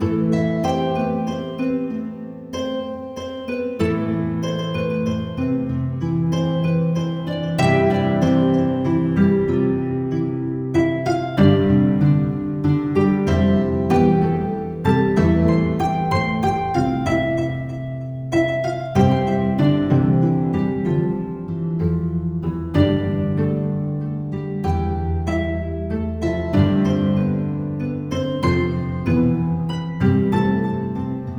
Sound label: Medieval Market LOOP; Shieldwall Chronicles: Swords of the North